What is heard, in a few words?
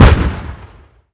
Inf Weapons; Red Orchestra 41-45